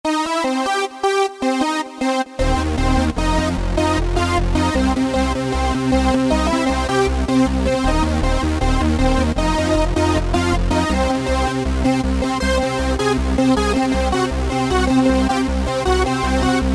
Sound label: Hook; Yamaha A4000; Syntraxx - Loops